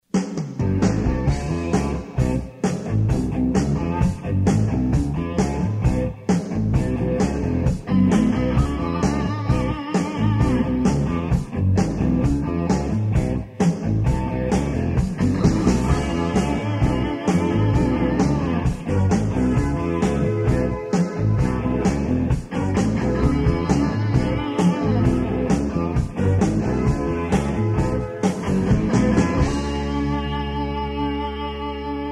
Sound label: pop rock